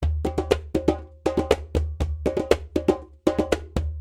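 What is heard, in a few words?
djembe melody rhythmic 120 beats dance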